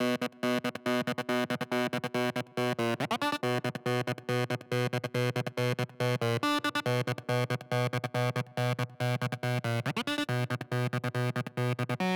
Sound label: Synth; ODGPROD